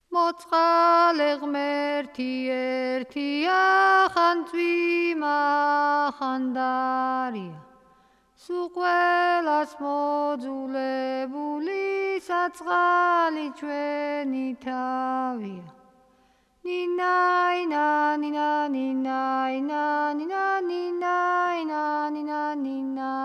nana akvnis dasazinebeli achara; Mzetamze

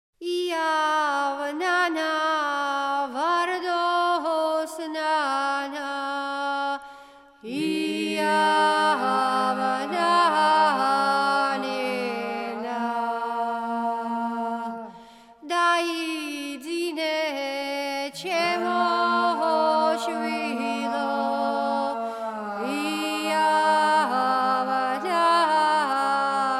nana akvnis simgera kaxeti; Mzetamze

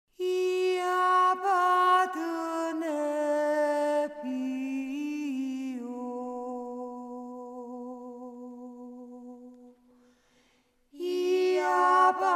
ia patonefi megruli samkurnalo; Mzetamze

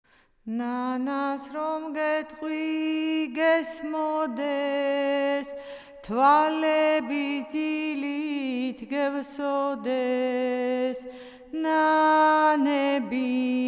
nana fshavi; Mzetamze

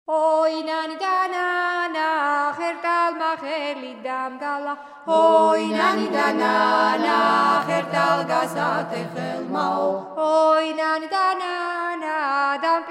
xertlis naduri acharuli shromisa; Mzetamze

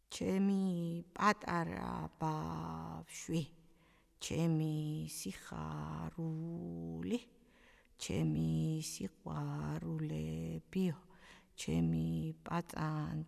bavshvis gasaRvizebeli mofereba tianeti; Mzetamze

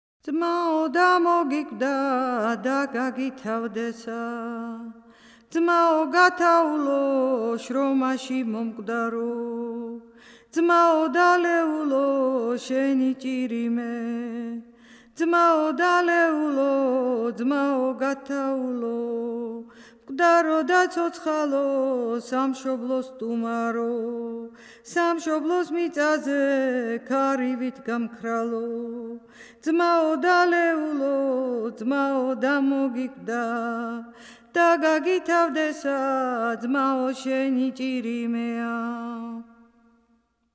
tirili tushuri mosagonari tirili; Mzetamze

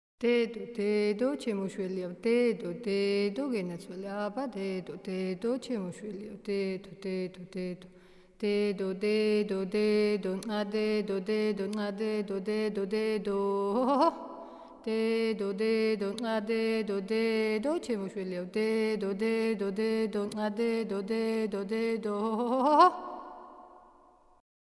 zroxis cvelisa mtiuluri shromisa; Mzetamze

